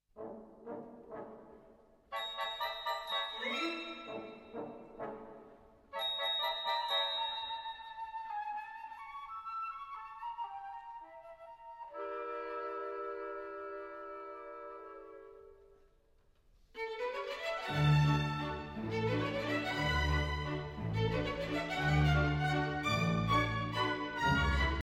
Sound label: La Fille Mal Gardee Male Variation